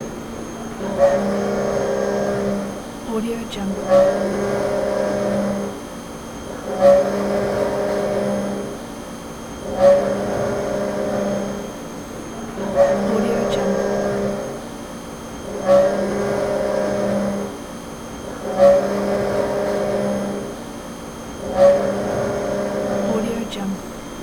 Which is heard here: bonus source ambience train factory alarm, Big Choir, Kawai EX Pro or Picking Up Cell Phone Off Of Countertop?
bonus source ambience train factory alarm